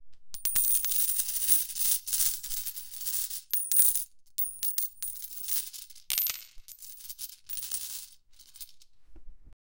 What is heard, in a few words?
gold coins falling; treasure